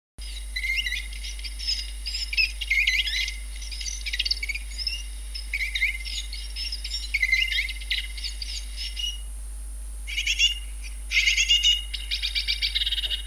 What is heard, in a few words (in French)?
charbo, mesanges; mesanges dans mon refuge LPO